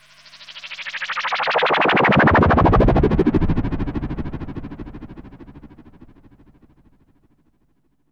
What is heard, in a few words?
FLYBY, SYN. FLYBYS; Best Service Alpha Dance vol.2